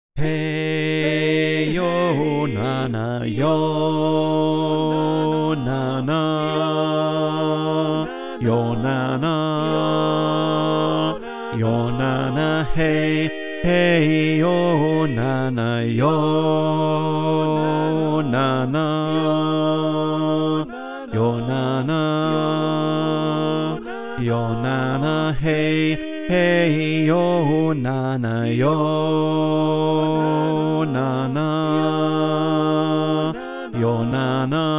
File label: Bass, choir